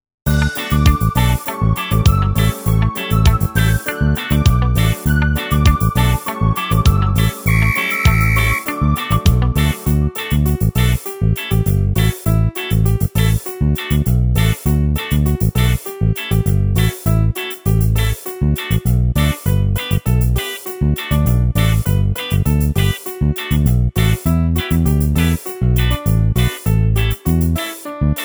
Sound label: Karaoke